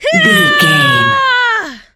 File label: voice female battle shout